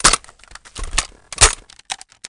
Machine Gun Reload

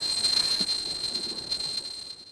subway break

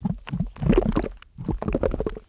tar; Ambient; MX vs ATV Unleashed

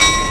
subway bell

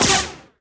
laser, weapons

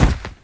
collide; oval

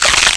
bail crack; skater; Tony Hawk's Project 8; XBOX

